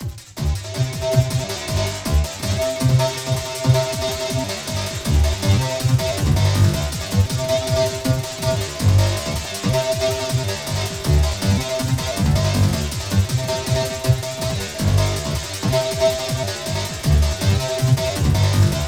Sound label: FX LOOPS; Zero G; Malice In Wonderland